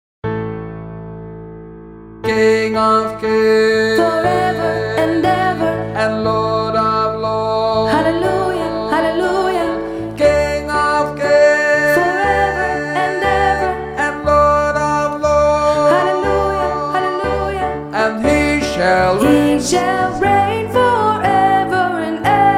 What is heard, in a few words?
Hallelujah alt